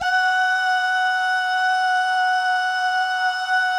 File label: DAH, Vocal Jazz; ILIO